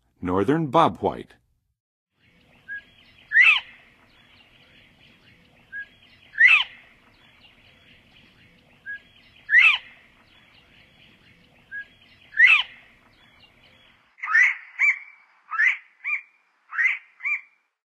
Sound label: Northern Bobwhite, Birds of North America; Western USA; Western USA Songbirds